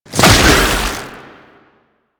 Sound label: Proto Infested Babau Underground Attack Tentacle Emerge, Enemies